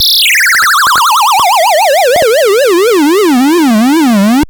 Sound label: Siren; Graz